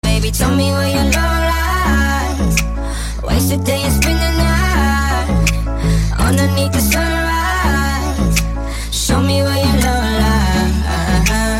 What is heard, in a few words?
Music